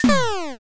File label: trap